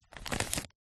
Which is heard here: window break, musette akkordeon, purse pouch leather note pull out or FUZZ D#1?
purse pouch leather note pull out